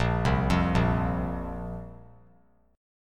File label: A sharp Suspended 2nd, down; arpeggiated; JGuitar